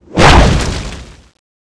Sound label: whip sword stab loop